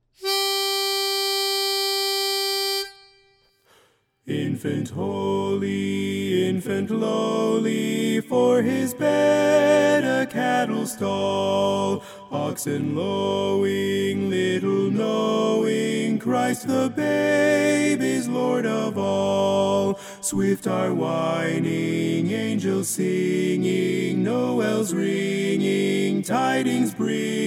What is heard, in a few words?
LEAD